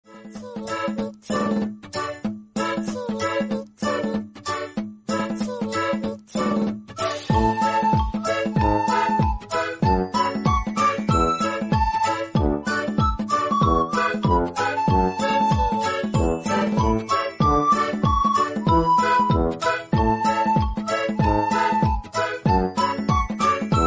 kawaii; keroro

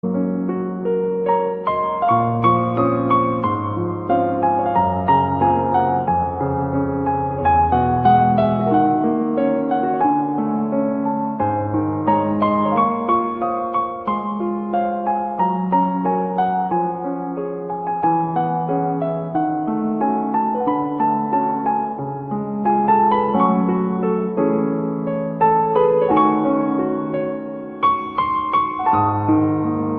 piano bgm; spirited away

